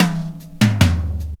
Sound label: Roll Like Thunder, Drum Rolls; Zero-G Jungle Warfare 1, 2 & 3